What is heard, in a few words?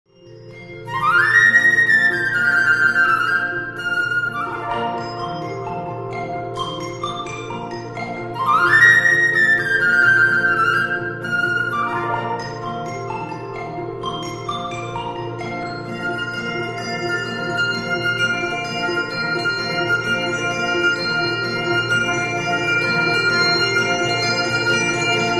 chinese dance